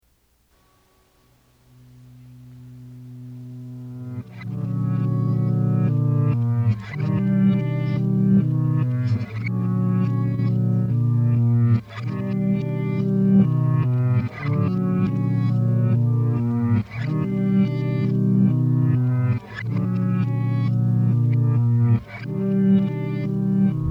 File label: Organ Dream